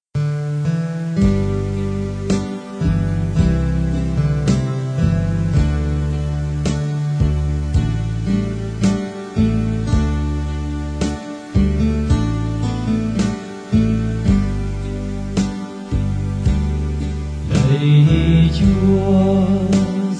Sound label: TENOR